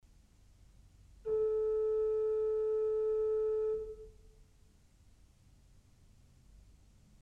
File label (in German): Principal 4' A, ORGEL pipes